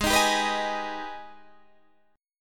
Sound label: G sharp Minor Major 7th G sharp down Guitar Half Step Down